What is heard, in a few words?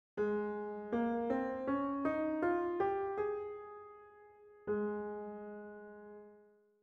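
Ab major scale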